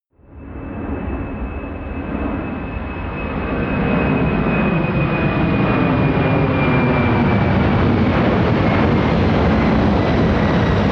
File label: plane large flyby land, Verkehrsflugzeug; flugzeuge verkehrsflugzeuge; verkehr transport